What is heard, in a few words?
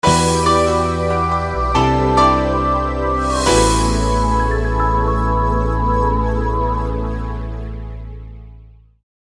Game Over; Sonic 3D Blast